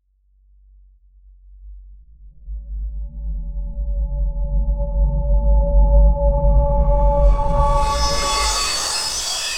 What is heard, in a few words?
sword unsheathed radiant, energy wave